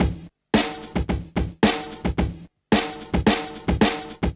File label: Street Tip, R&B Drums